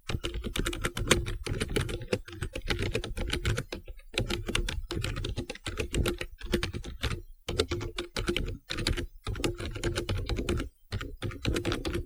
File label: keybord